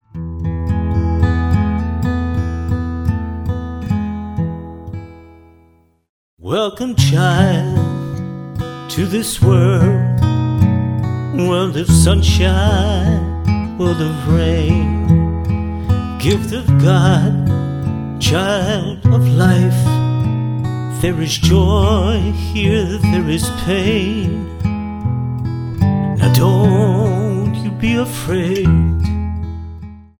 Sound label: Kamaole Lullaby